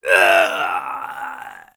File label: male scream; npc; DeadFright